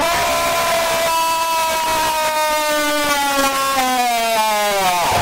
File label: scream; bear 5